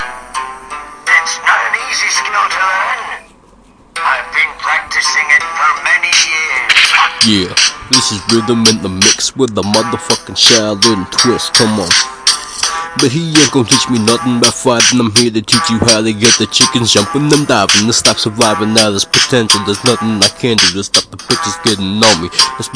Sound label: rap